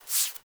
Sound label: Foley Idle Lick Furr; Enemies; Warframe; Catbrow